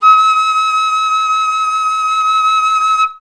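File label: flutes D#5; Best Service; Peter Siedlaczek's Advanced Orchestra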